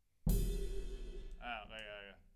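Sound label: SNR Top, snare top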